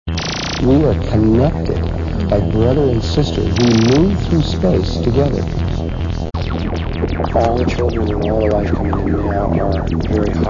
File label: ultrasonic heartbeat